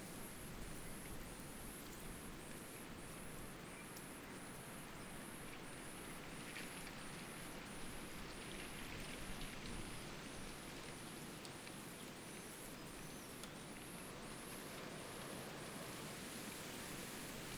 Vento com folhas cigarras constantes pica pau rio longe

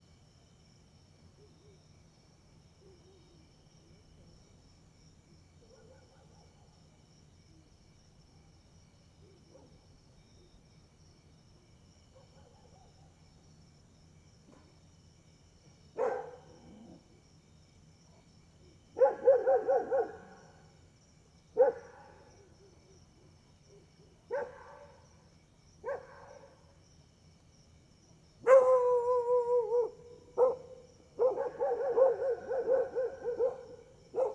Cachorros latindo longe e depois perto a noite com grilo e morcego; animais; Coleção Sonora do Cerrado

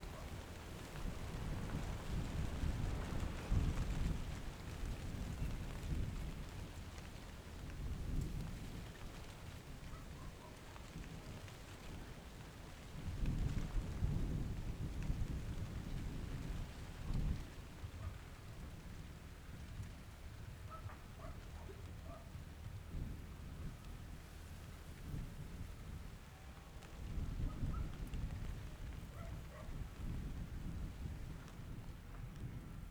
Ventando nas arvores no quintal som de folhas com cachorro latindo longe

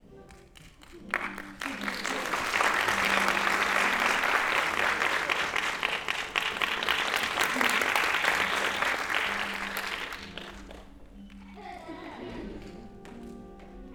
Apresentacao palhaco com musica, aplausos no inicio e risada leve de crianca; multidao

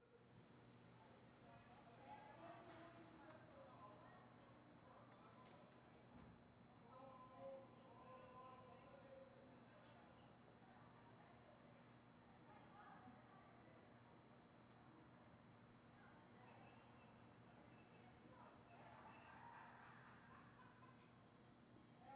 Ambiente interior apartamento noite vozerio e risadas de vizinhos; ambiencia domestica

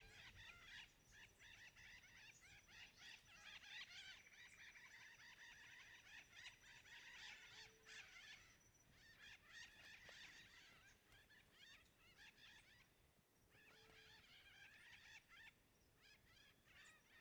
Periquitos e outros passaros em ambiente rural; aves; Coleção Sonora do Cerrado

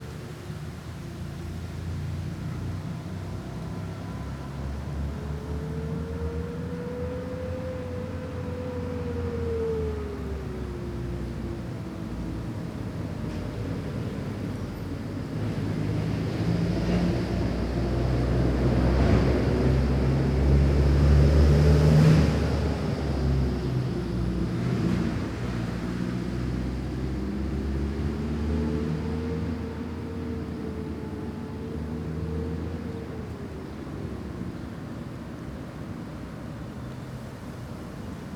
Onibus diferente passando pela praça com vento leve nas arvores; maquinas; canais stereo

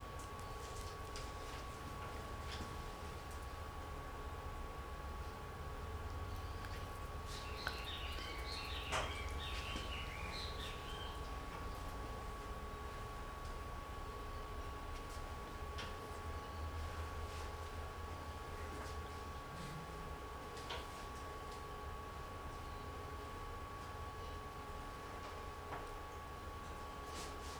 Ambiencia sala com projetor com pessoa mexendo em coisas e andando silenci

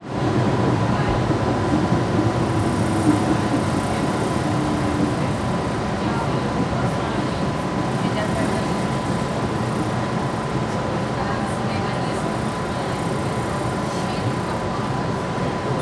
Vozerio de Conversa Adolescentes Dentro do Metro Parado; multidao

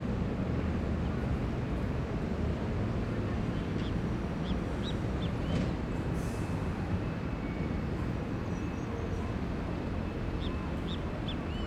Ambiencia gramado perto da rodoviaria do plano piloto com transito longe

